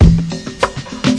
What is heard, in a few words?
conga beat, 100 bpm; 091 to 100 bpm